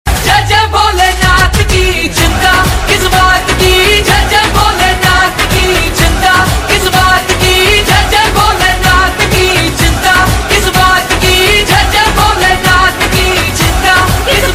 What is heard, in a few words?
Bhakti